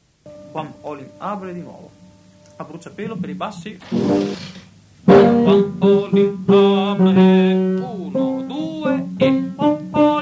Requiem Hostias tenori; Mozart

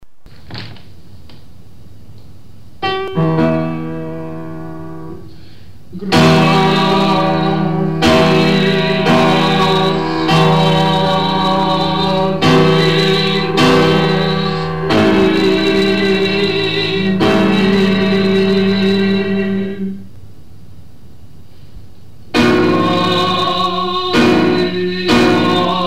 Propter magnam gloriam Tuam Contralti; Vivaldi

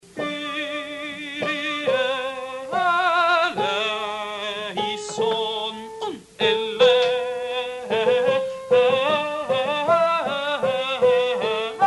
Requiem Kyrie soprani; Mozart

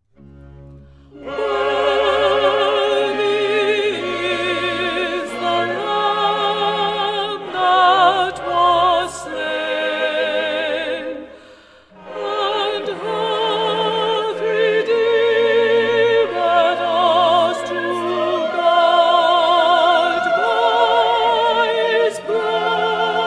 contralti; Worthy is the Lamb that was slain; Messiah; handel messiah

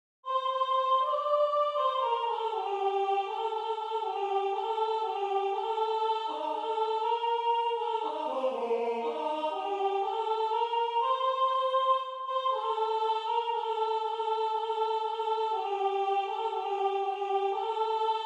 Fermarono i cieli contralti; Santucci; natale

